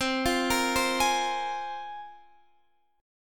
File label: C 7th Suspended 4th Sharp 5th, C, down, Guitar, DADGAD